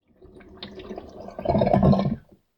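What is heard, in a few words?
drain outlet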